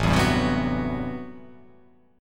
A Minor 13th A, Chord; Am13 strummed; JGuitar

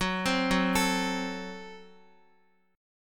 Gb Diminished Guitar; Gbdim arpeggiated; x,x,6,5,6,5

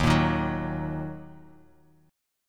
D sharp Minor, chord

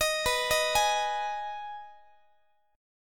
B 6th B down Guitar 4A 4E 5A 5D 5G sharp 6C sharp; arpeggiated

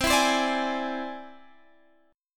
E Augmented 7th C, guitar

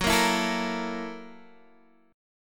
Gb 7th Sharp 9th Gb down Guitar Open G

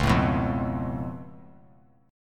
Db Augmented 7th Db down; strummed; JGuitar

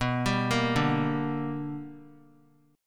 B Minor Major 7th Sharp 5th, chord; arpeggiated; JGuitar

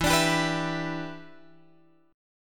E Minor 7th, down, Guitar, Open F; Em7; strummed

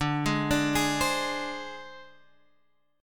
Ab Major Flat 5th, chord

